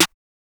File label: Snare